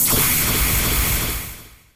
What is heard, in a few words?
Beam; dark witch music episode rudymical